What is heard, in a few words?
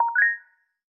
Unlock Unique; LG Wing 5G SKT